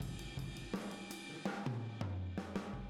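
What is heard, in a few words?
Boogaloo Fill Room mono, 82 BPM; Sonic Foundry; Drums from the Big Room The Mixes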